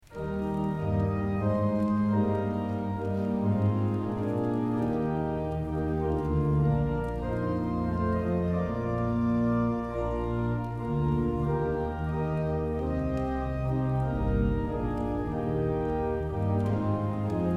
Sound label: hymn